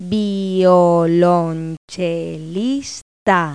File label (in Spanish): VIOLONCA; Pipo-Aprende musica con Pipo